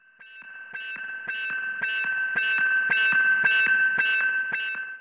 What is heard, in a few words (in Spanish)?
sirena